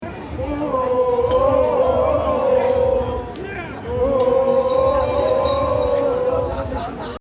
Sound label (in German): Gegroele